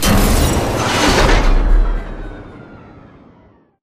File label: vertical fan switch shutoff; beacon; Titanfall 2